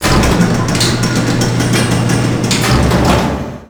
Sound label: garage; oklahoma